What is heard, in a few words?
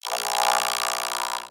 robot scream; Screaming for cyborgs; tbd-station-14; Machines